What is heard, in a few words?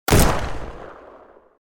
revolver rifle shot